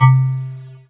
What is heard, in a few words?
Yamaha PSS-140 Jug C3